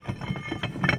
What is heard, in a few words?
reload